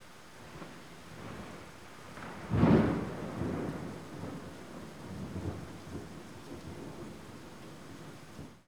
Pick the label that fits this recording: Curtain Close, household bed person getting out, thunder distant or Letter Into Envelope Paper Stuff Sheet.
thunder distant